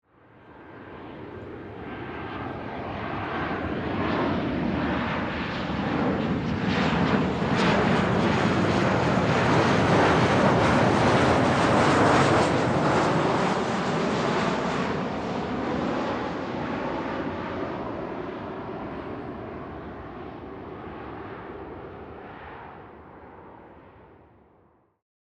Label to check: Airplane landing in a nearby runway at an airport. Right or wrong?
Right